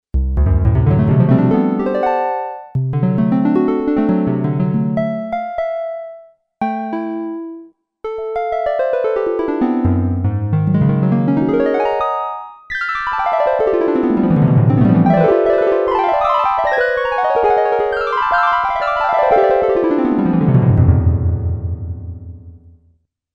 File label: HARP, Korg DW-8000; Factory Patches